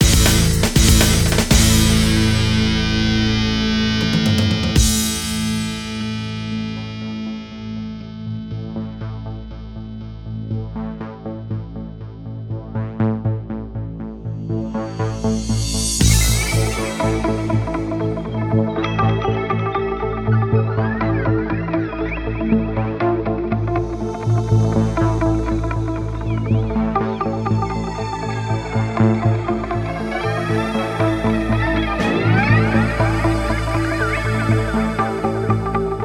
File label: End Match; Demons With Shotguns; macOS PS4 Windows Xbox One